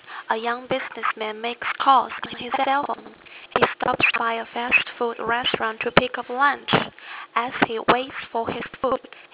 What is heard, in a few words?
stutter; audio-problem-dropouts